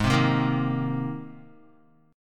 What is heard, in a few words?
Ab Major Add 9th down Guitar; Abadd9 strummed; JGuitar